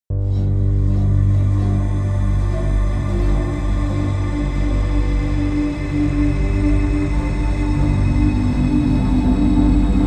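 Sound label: erratic, pads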